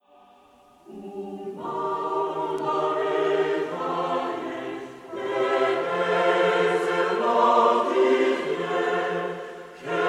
chant en echo pour un mariage; rossi

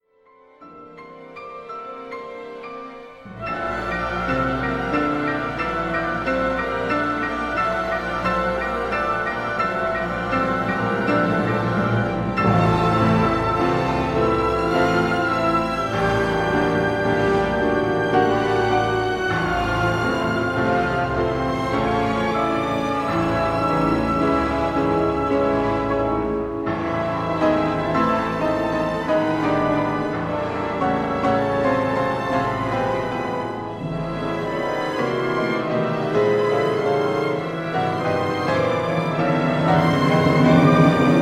concertos pour piano, andante; Concerto pour piano et orchestre N°1 de Zara Levina

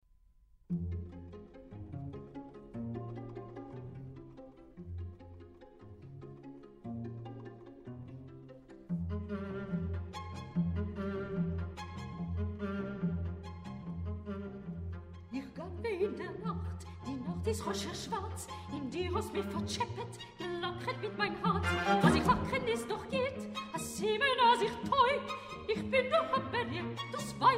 chansons de cabaret yiddish pour voix et quatuor cordes; desyatnikov; ikh ganve in der nakht

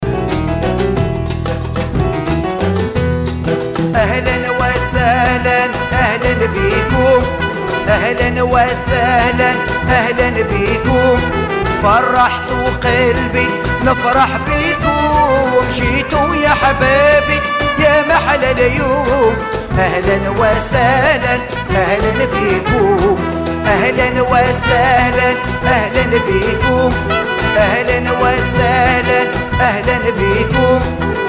danse flamenco marocaine

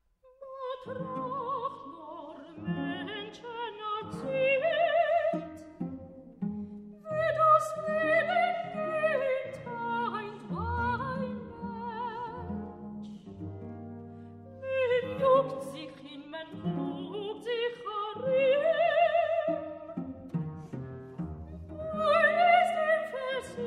chansons de cabaret yiddish pour voix et quatuor cordes; desyatnikov; in a hoyz

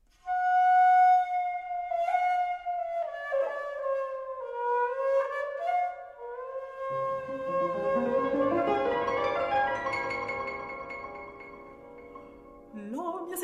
traditionnel yiddish; itsik

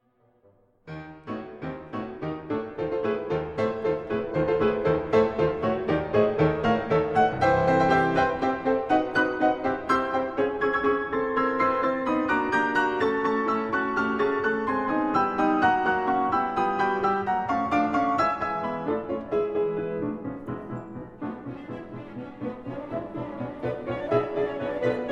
concertos pour piano, allegro; Concerto pour piano et orchestre N°1 de Zara Levina

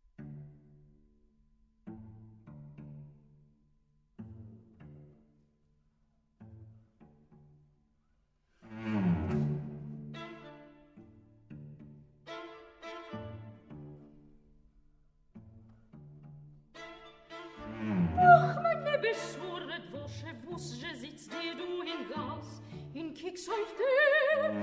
chansons de cabaret yiddish pour voix et quatuor cordes; desyatnikov

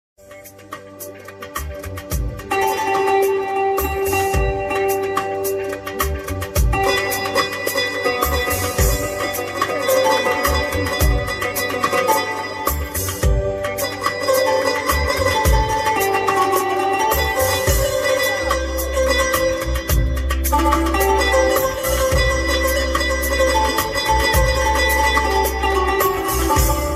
instrumental oranais sur l'air des selihot

